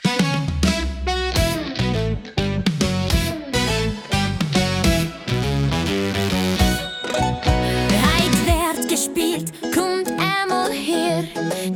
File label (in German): song Sängerin